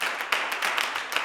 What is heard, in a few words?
CLAPS 96; Spectrasonics; Vocal Planet; Blues Soul; G. CLAPS